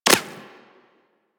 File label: Bullet Impact Flesh; Warframe; Weapons